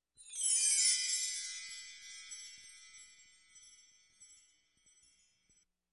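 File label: chime; Перезвон колокольчиков создаёт волшебные звуки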